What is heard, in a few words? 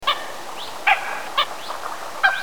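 mandarin duck; osidori; toritori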